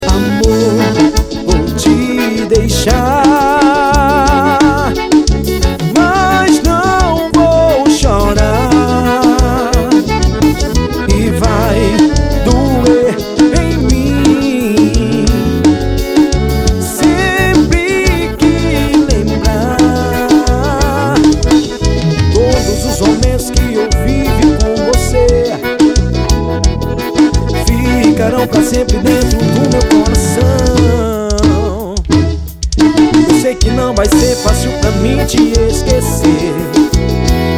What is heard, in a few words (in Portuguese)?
forro ao vivo caipiras show bar; cuite pb